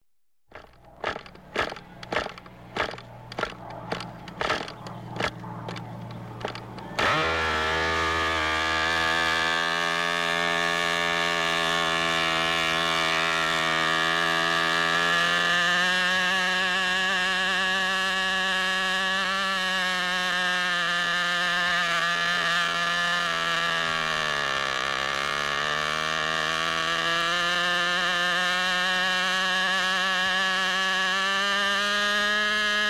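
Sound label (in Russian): модель самолёта взлёт полёт и остановка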